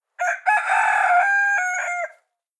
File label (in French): ferme coq